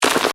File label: Hit Crunch Scrape; Hit Sound Effects; Sound Design